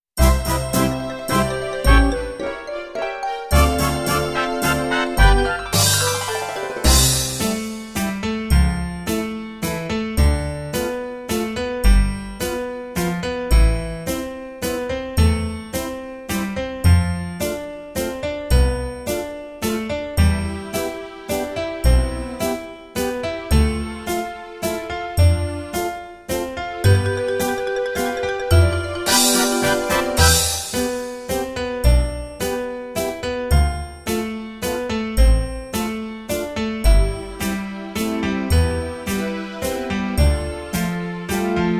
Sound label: waltz